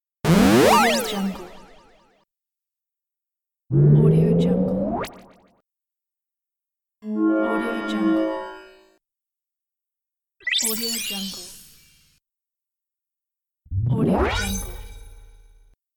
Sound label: teleport; fx for video games